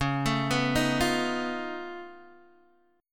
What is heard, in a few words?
C sharp Augmented 9th C sharp down Guitar DADGAD, chord